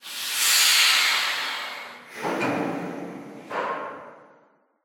Boiler Compression; Hello Neighbor; Windows

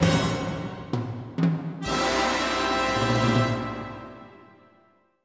Action END; bolt 2008 pc gamerip

